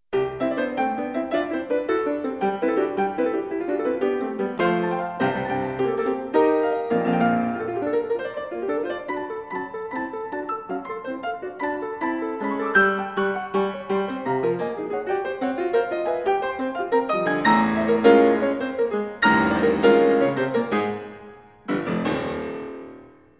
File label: Sonatina piano; spring 1967